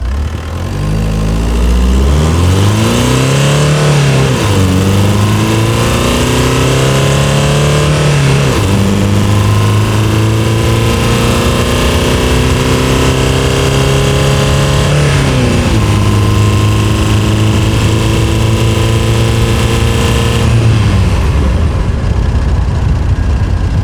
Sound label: Audi A4 B8 2.0 TDI Engine Acceleration With Wind; Stereo; SoundHolder; Audi A4 B8 2.0 Diesel Library